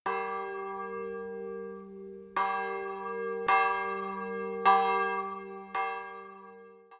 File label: Bell G1, electronic bells; sacral building acoustics